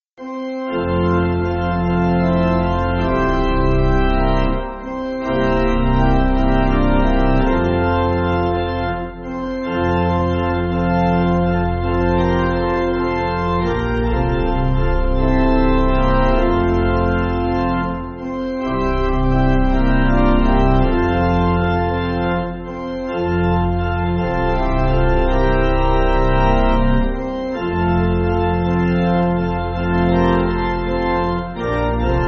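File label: Pipe Organ, church backing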